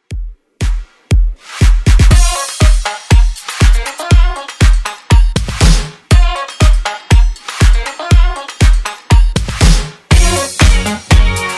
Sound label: Pop House Redrum